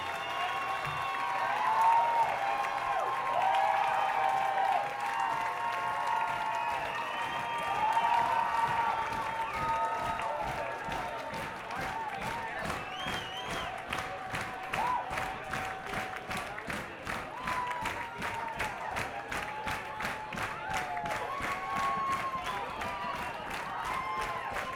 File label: Crowd